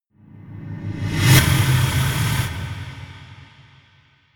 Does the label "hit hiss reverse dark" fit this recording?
Yes